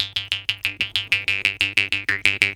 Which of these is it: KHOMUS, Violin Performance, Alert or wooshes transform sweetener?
KHOMUS